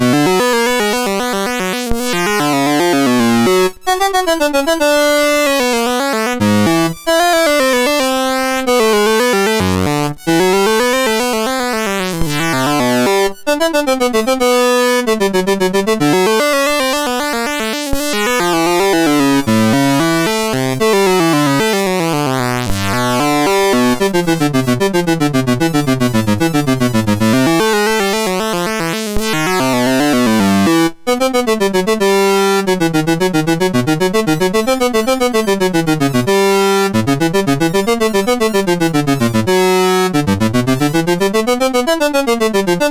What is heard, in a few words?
Fugue in E Minor, MOS6581R2